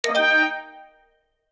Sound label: level up